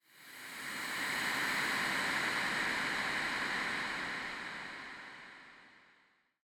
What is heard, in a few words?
The Sound Of Wave Coming Toward The Beach